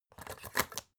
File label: folder clamp flip